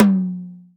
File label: TOM, DRUM, DRY KIT; AKAI S6000 CD-ROM - Volume 1; DRUM RYTHM